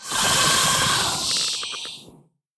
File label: Phase Spider Play